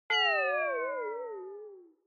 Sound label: Onoma Negative Mid; オノマトペ ネガティブ; 中